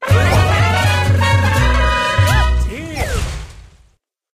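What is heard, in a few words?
total win fanfare; willyshotchillies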